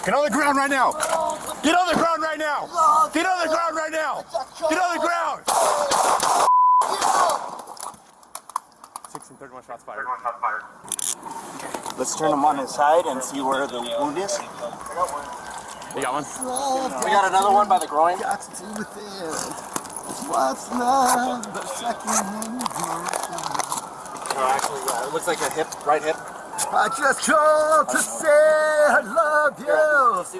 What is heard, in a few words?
SINGING SUSPECT SHOT